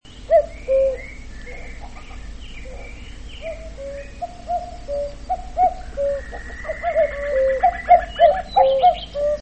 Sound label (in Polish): kukułka - śpiew